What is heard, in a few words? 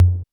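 low toms 808, PERC SET 808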